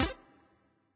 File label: collect; Aviamasters; bonus